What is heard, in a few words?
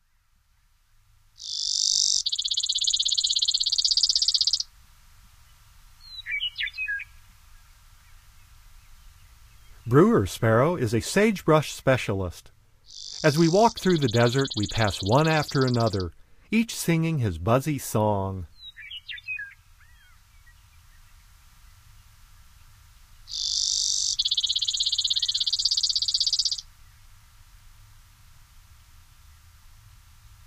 Brewers Sparrow, Bird Sounds; North America; Common Western Species; Know Your Bird Sounds